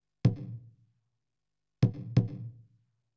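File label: percussion, house, 125; eJay; Drum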